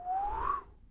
Wind Whistle Woosh Whhhua

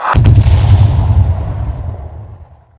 Explosion; PreShifted

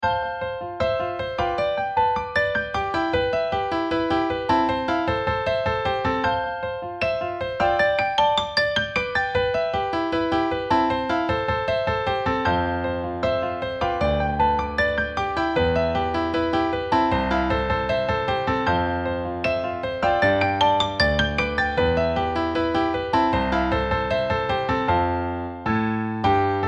piano

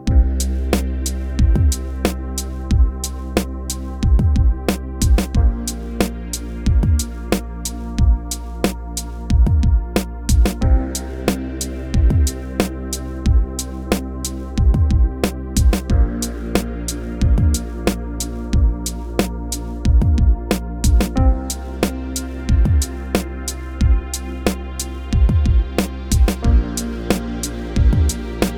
game menu looping

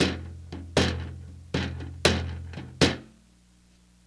ammo loop off

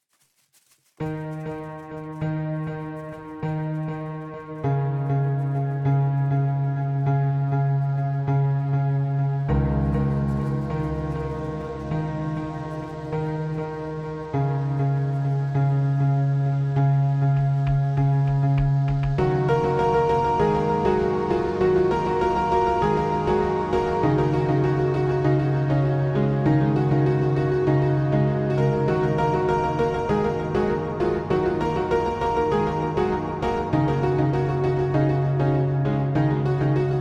ambience pianos

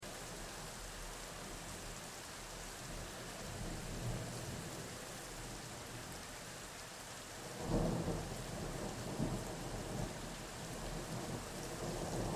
storm siren

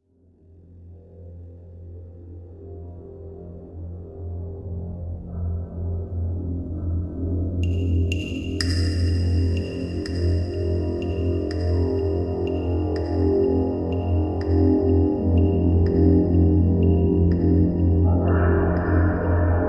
Music piece; volcanic caves; Radakan

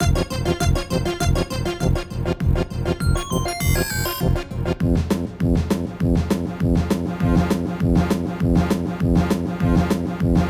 battle

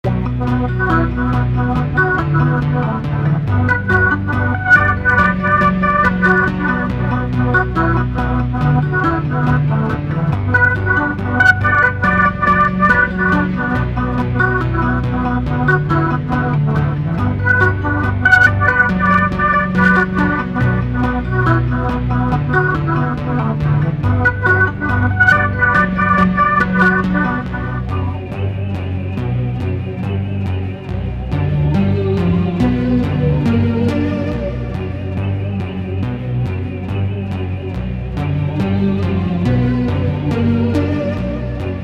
Techno

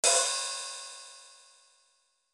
splash, basic sound effects

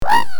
high pitch hitted

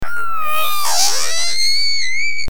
high pitch scream

